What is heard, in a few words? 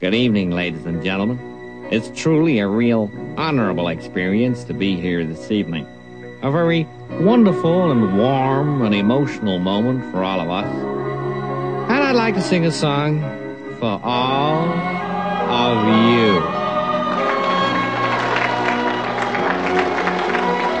cheesy song intro; MALE MISC and SPOKEN WORD